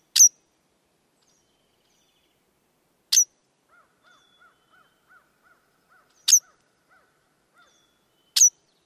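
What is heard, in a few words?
metallic chack calls, Red-winged Blackbird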